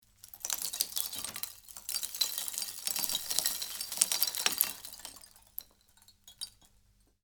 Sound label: Slowly pouring tiny debris on a rock pile; impacts